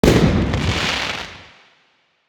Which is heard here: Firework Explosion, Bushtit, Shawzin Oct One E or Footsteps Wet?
Firework Explosion